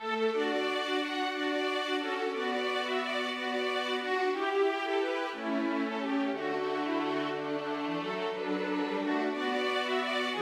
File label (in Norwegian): Gigue for to fioliner, cello, fløyte, horn og piano